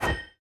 dreamshield attack

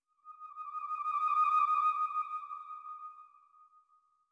flute trill; Sky Children of the Light gamerip

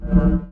Sub Menu Present; Welcome to the Game II

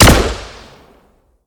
Shot Medium Gun Mech Rifle; Robobeat

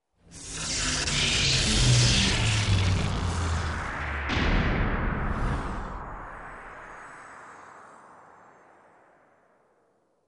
Logo; Blizzard Entertainment

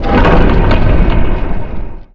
Key open

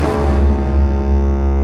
Mus Tsunami 145 Light; from dust

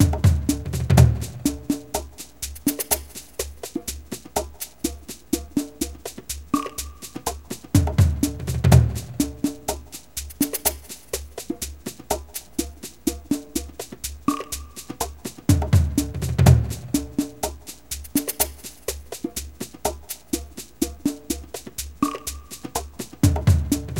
Battle (Idle); the legend of zelda skyward sword wii gamerip 2011

